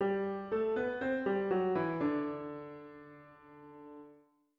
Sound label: melodic dictation